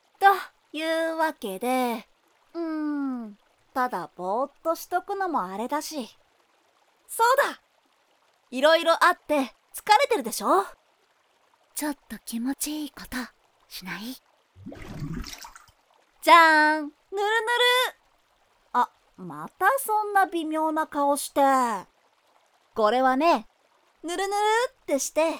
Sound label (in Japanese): ぬるぬるマッサージ